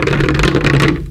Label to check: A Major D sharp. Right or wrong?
Wrong